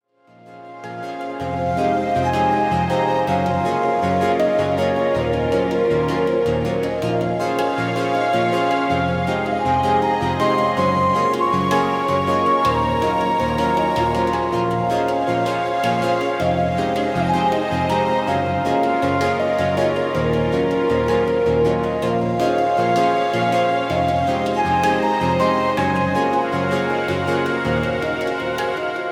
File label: Relaxing